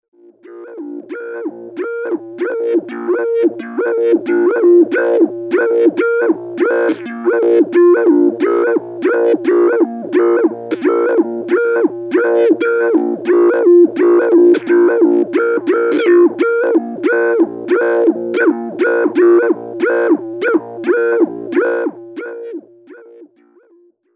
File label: random, Pseudo Random Patch, Doepfer